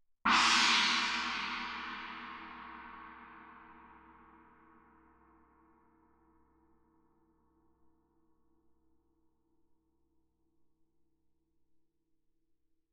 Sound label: chinese mallet ff, suspended cymbals; Percussion